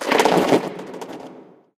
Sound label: player exit jump paint